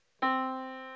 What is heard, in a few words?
Yamaha Disk, piano sound